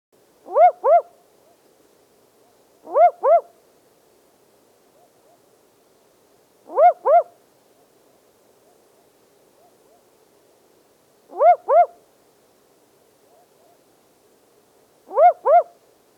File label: barking owl